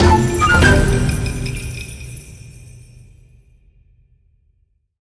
winMedium; templeofnudges

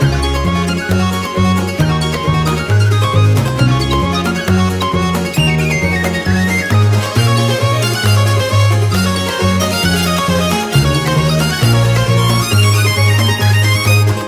main loop layer; irish pot luck

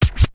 spin Button Click; scruffy duck

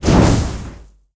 fire Throw; willyshotchillies

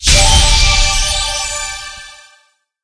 free Spin Start

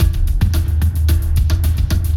excitement perc; spinsane

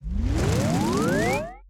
Wild Walk; knight rider

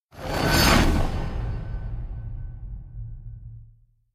scatter Won; the wolfs night

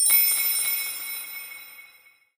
multiplier Activated; parthenon

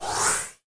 gamble Lost; jacks or better multiple hand

